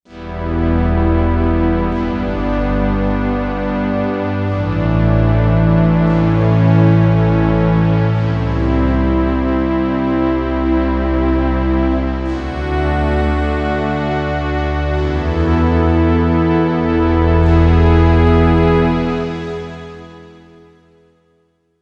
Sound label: Steamsynth, Korg M1; Factory Patches